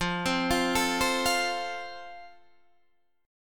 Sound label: F Major Guitar Half Step Down